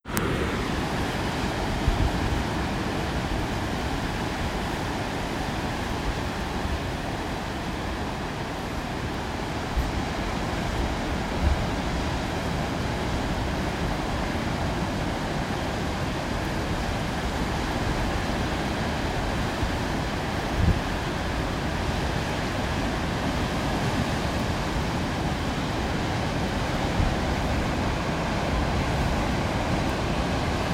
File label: Rolling swells at Cape Paterson